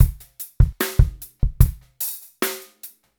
075 Pop Busy Ballad Drums; Pop Rock; Slow Tempo; Digidesign; Pro Tools; Pro Tools Essential Loops

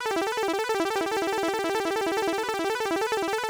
137 Dance Synth Hyper Pan Amin; Pulsing; Digidesign; Pro Tools Essential Loops

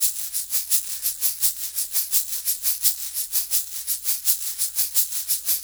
Pop Shaker; Pop Rock; Pro Tools Essential Demos; Digidesign; Essential Loop Demos; Demo Session - Pop Rock

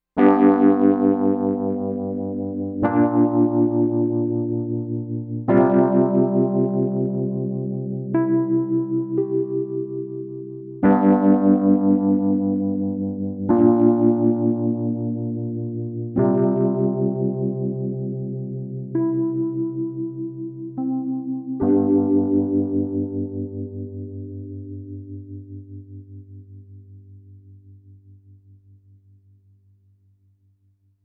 Wurli; Pro Tools Essential Demos; Digidesign

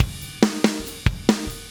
140 Groovy Fast Drums, Very Fast Tempo; Pop Rock; Pro Tools Essential Loops; Digidesign

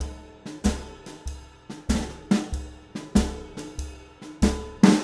095 Tradition Jazz Drums; Pro Tools Essential Loops; Digidesign

